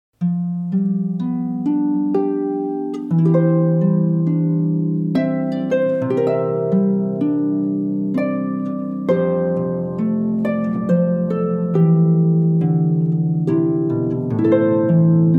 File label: Harpists; Classical; Amazing Grace; Devon Jeff Harpists